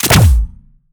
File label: future weapons venomspitter shot; future weapons 3; soundmorph